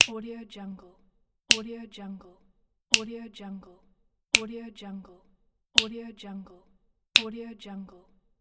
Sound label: the finger snap